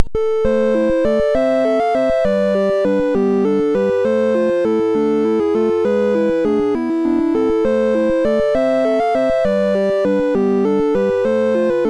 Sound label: MOS6581R3, GAMES; Wielrennen